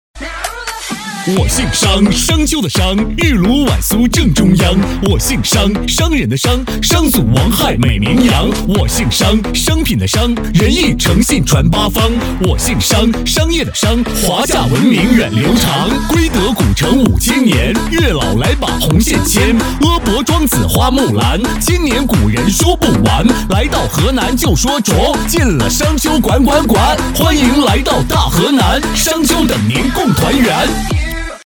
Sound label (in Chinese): 喊麦 样音; 我姓商